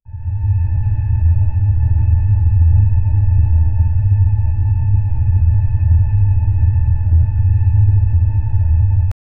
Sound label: a colossal spaceship engi, spacecraft